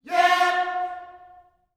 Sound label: "yeah", gospel vocal, F4; Spectrasonics; Vocal Planet Vol.1 Gospel; akai